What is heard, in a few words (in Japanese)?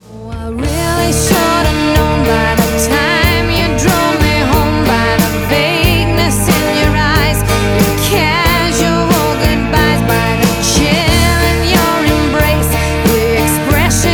ポップス女性